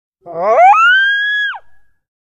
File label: Coyote Howl, Single. Medium Perspective.